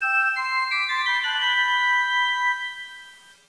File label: drum